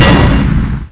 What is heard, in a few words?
Power Up